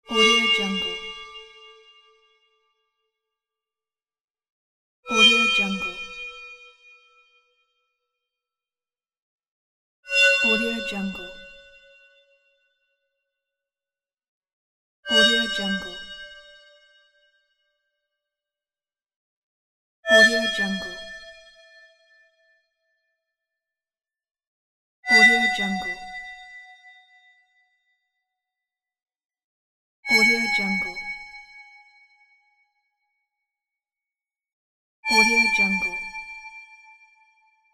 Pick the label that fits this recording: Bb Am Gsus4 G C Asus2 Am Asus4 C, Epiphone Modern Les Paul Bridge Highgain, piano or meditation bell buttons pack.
meditation bell buttons pack